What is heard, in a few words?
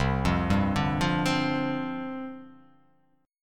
C Suspended 2nd Suspended 4th C; arpeggiated; JGuitar